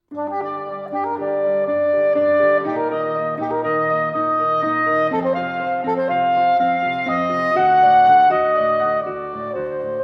Sonata For Violin and Piano (Arr. For Saxophone); Movement I

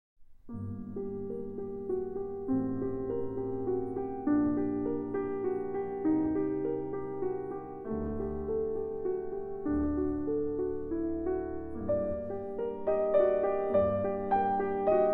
Flute Sonata No. 2 in E-Flat Major, II. Siciliano

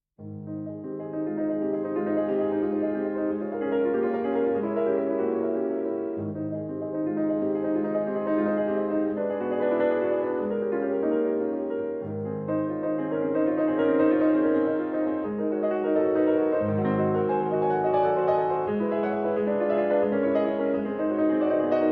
Pieces for Piano, Studio. Presto; 6 Pieces for Piano, P. 44; Respighi; No. 5

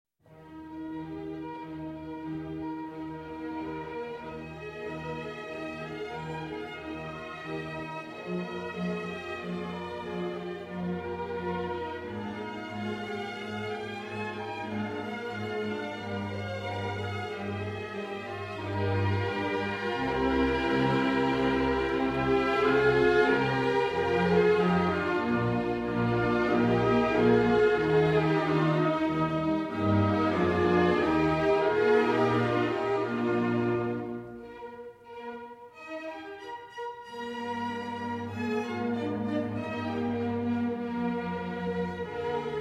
Violin Concerto No. 1 in B-Flat Major; David Oistrakh; Mozart

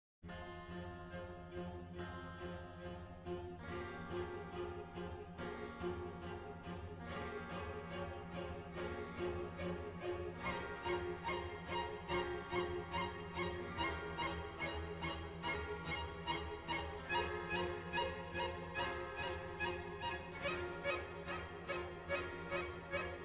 Violin Concerto in F Minor, Allegro Non Molto; The Four Seasons, Violin Concerto No. 4; RV 297 Winter

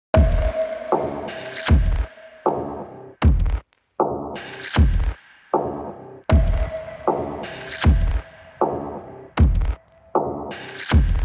Cathedral Clock

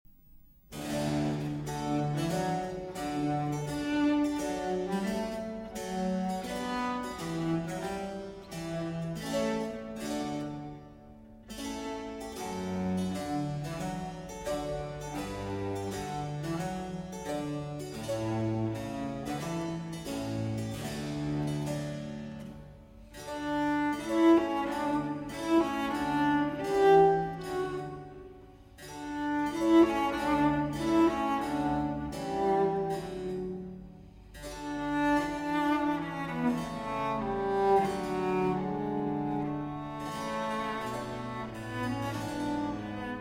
Cello Sonata in E-Flat Major; Larghetto; Vivaldi

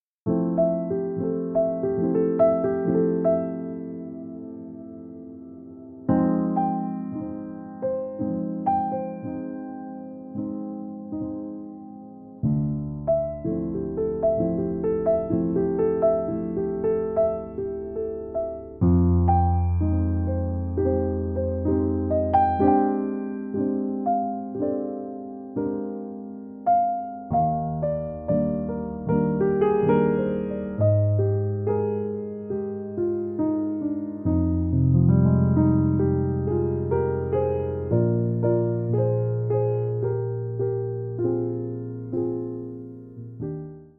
Jazz Instrumental Chill

